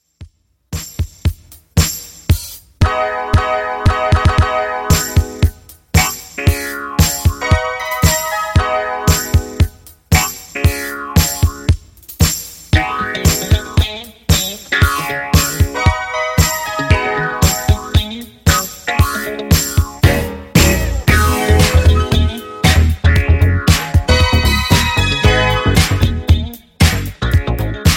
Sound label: Intro Outro Clean 115 Bpm